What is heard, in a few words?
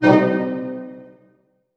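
harp hit F# minor; Sound On Sound; Peter Siedleczek's Orchestra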